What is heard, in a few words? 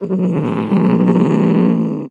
Cat Domestic Growl